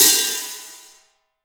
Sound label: FLANGE 14 HH